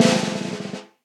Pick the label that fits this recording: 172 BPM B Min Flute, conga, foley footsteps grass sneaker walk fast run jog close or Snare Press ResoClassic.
Snare Press ResoClassic